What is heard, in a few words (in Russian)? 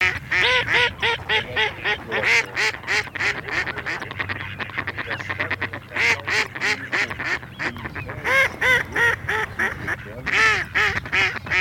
Кряква Germano; plurifon